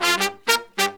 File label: Horns, 96BPM; 90 s Sample CDs; Zero-G; Phantom Horns